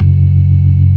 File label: ORGAN, NO PERC